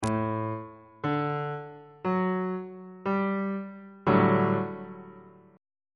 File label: f double sharp major 7th suspended 2nd 1st inversion on bass clef